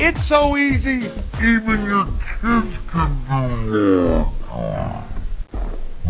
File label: die, bullchicken; svencoop; cracklife